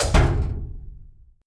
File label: Panel Beverage Land; Among Us